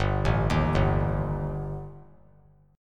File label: G Suspended 2nd Sharp 5th; Gsus2#5 arpeggiated; JGuitar